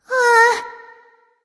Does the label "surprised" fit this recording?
Yes